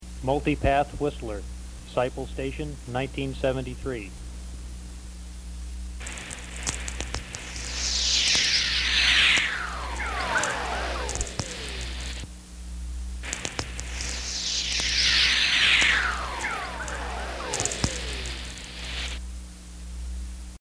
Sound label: multipath whistler; sferics